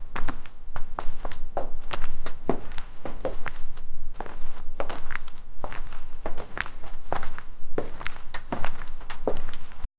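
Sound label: footsteps walking on glass, broken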